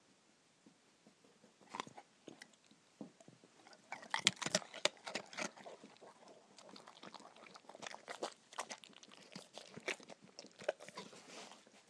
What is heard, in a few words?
sloppy dog treats